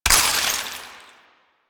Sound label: Ammo Pickup Cold; DE; Warframe; Warframes; Frumentarius; AmmoAbility